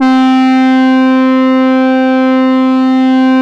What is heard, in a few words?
REED, E-MU Producer Series Vol. 6 – Analogia Project, Strictly Synthe, Smoov Leadz